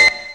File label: HONK, Synth FX Misc